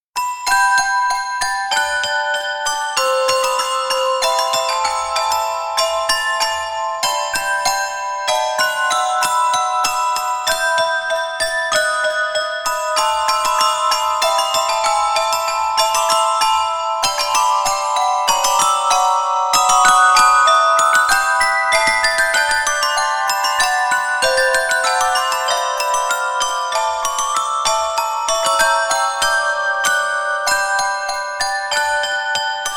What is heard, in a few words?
handbell